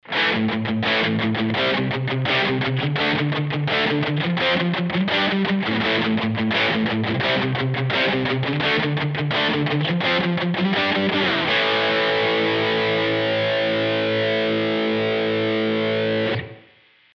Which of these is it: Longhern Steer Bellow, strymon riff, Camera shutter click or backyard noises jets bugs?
strymon riff